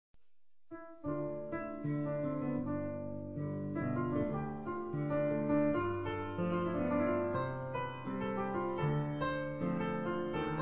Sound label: piano